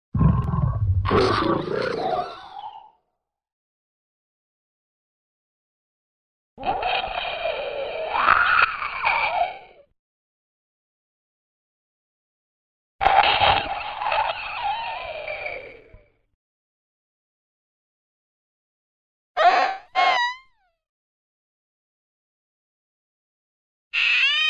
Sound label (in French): bruitage monstre